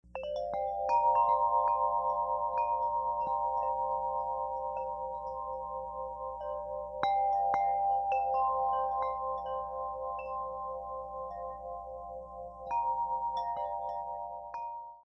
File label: Rainforest